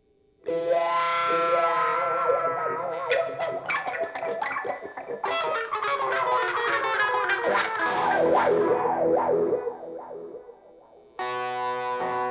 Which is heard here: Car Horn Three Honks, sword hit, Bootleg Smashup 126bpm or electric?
electric